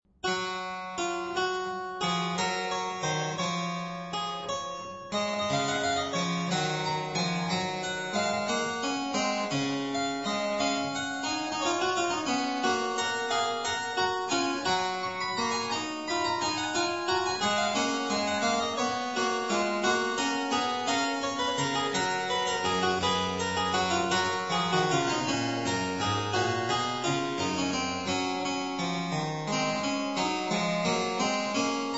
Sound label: Variatio 26 a 2 Clav.